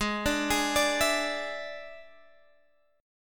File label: D Suspended 2nd Flat 5th G sharp